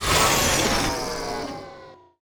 bumper car decelerate; tf2

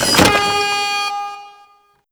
race gate open; Garry's Mod; racing tool

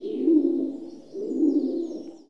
dove; ambient; Garry's Mod; tf2; rottenburg

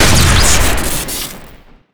sniper railgun single crit; tf2

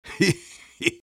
laugh short; engineer; Garry's Mod; tf2 content

